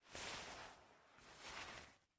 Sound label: Move Sit Chair; MiSide